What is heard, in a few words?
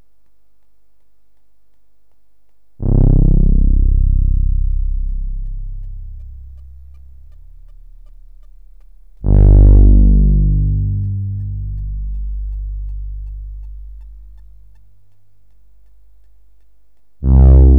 TAURUS; cubase; CUBASIS; MGTAURUS